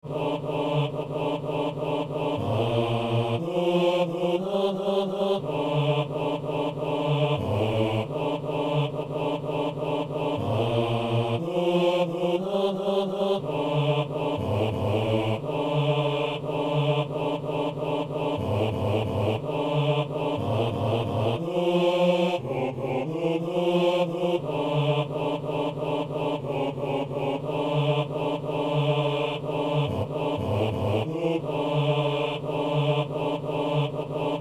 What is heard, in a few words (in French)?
basse; Bénis le Seigneur, O mon âme